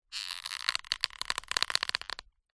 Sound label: foley plastic squeeze stress creak against dense foam; zapsplat